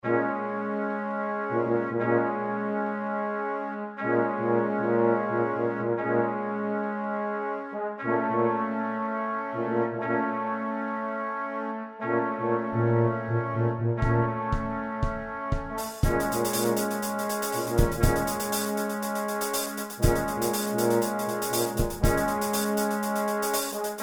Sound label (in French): Trompette Trombone Tuba Batterie